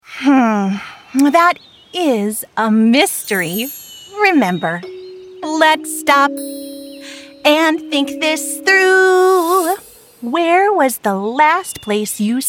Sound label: Singing Mom